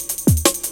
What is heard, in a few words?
Step Off Cut, drum loop, jungle, 165.5; Zero-G Jungle Warfare 1, 2 & 3